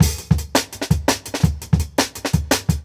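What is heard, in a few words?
Jungle Breaks, 168 bpm; Original Jungle Breaks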